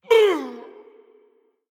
hitreact human male large

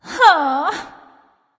sigh; daji

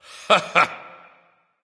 "heh heh"; smite; voicepack; ullr

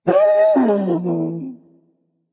fear berserker; cuchulainn

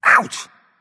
ouch; smite; susano

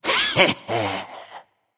hehheh; smite; apollo

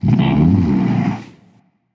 Thinking; smite; voicepack; hercules

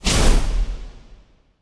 dash; Smite; Cabrakan

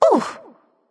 ouch; smite; nox

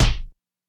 fire impact; smite; poseidon

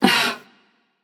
ugh passion; chaac

